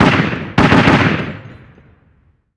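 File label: gun 50cal medium; Call of Duty Modern Warfare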